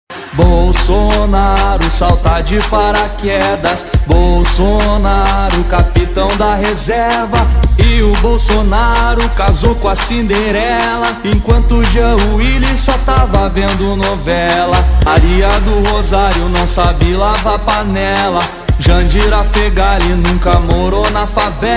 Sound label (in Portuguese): toque para celular; bolsonaro